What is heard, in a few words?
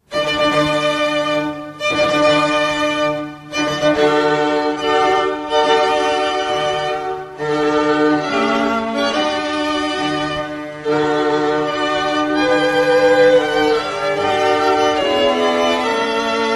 bridal chorus; Wagner